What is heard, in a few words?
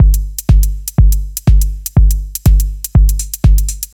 kick and repetitive tech house kick loop